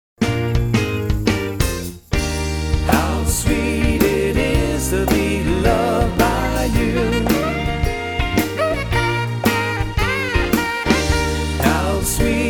Variety Band; Atlanta

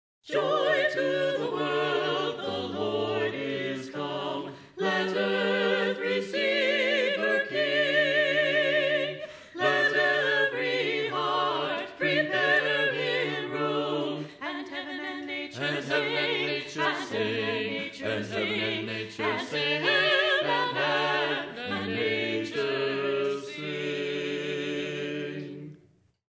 Carolers; Boston; Joy to the World

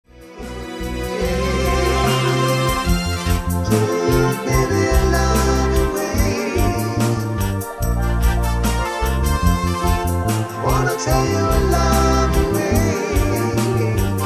Steel Drummer

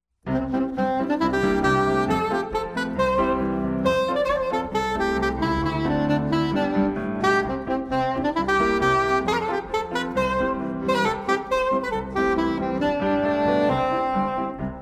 Irish Band; Chicago Irish Band

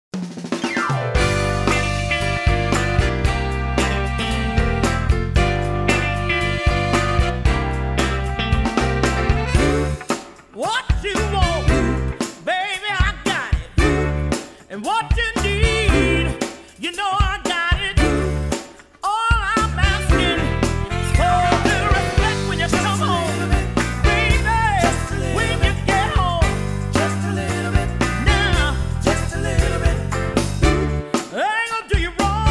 Variety Band; Atlanta; since their beginning in 1989

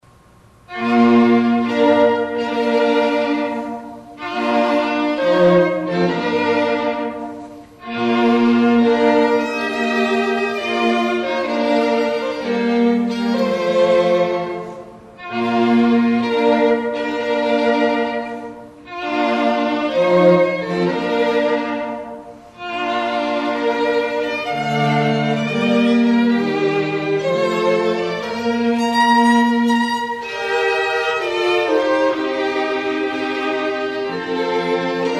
String Quartet; Baltimore

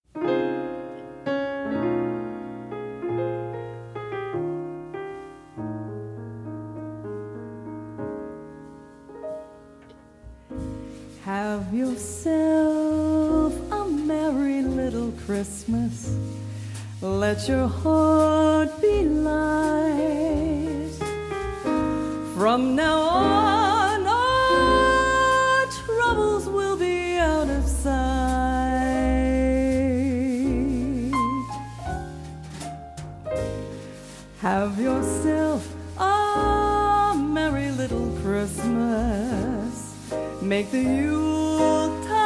Christmas Trio; Seattle

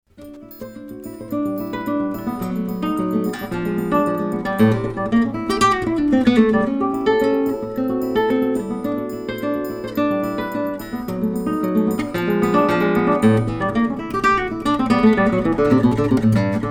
Guitarist; Portland

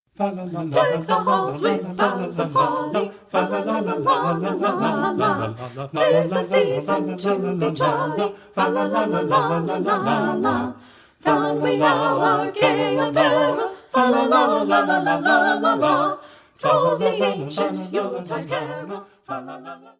Carolers; Minneapolis

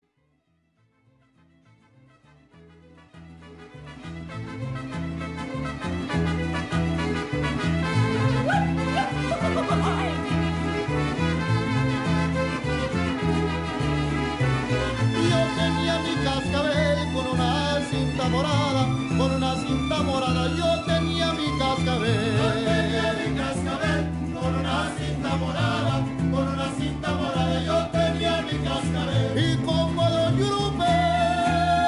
Mariachi; Austin

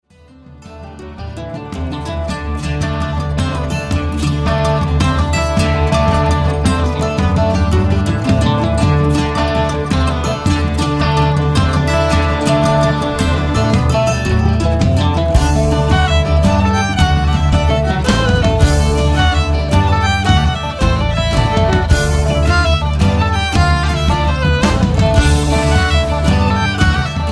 Celtic Band

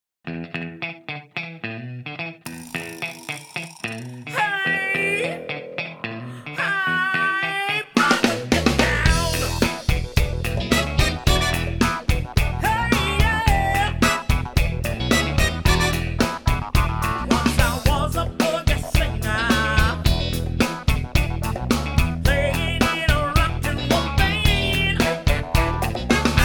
Variety Band; Atlanta

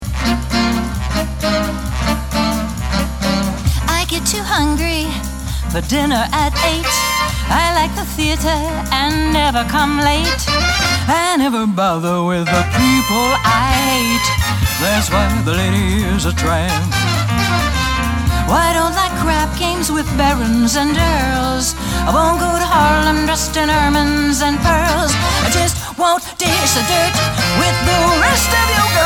Jazz Singer; New York Jazz Singer; award-winning jazz singer

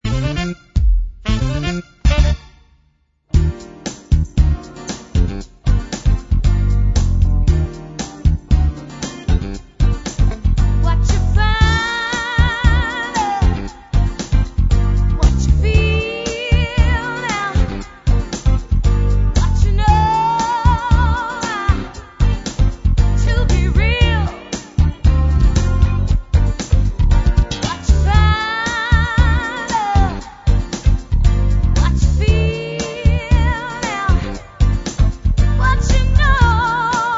Party Band; Sydney

